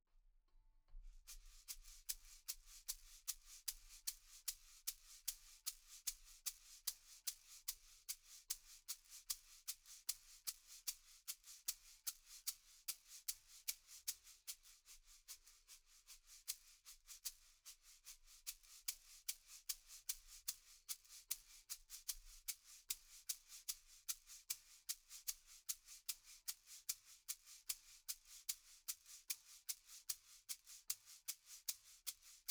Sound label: shaker